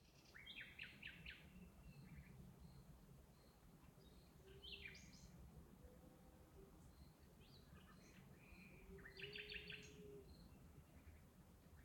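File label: Ambience Countryside Night Birds Cow Cricket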